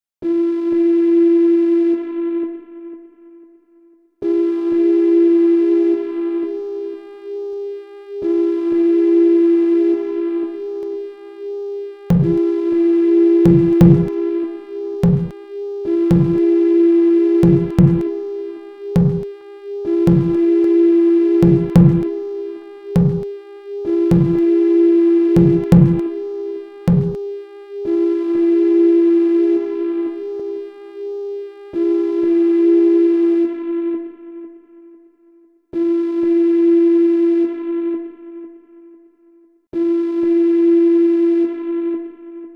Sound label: Sinewave